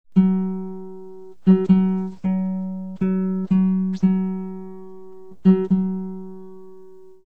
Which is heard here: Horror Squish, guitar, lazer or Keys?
guitar